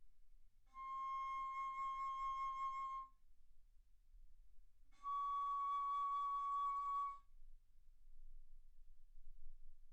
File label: Bass Flute C6 Db6; ff